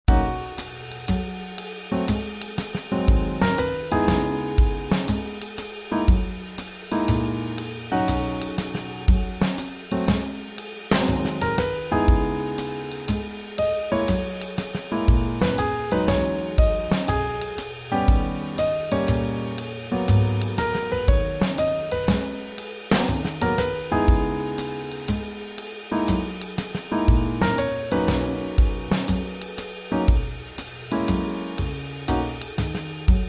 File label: Swing Jazz